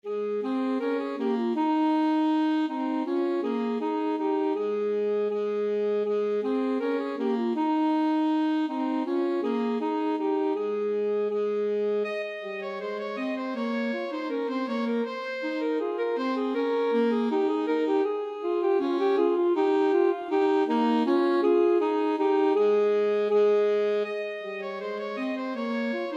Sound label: alto saxophone duet